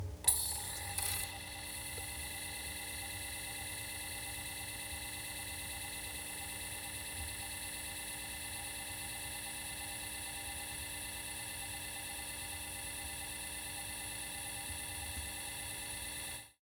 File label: Technology Electronic CD Spin In CD Player